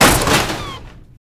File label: Electronics Misc Destroy; Warframe; Ambience; Nineteen Ninety Nine